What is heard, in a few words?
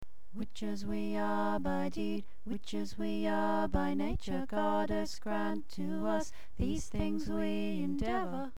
Witches We Are Chant; Power Raising